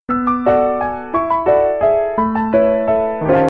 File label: nokia tune jazz; ringtones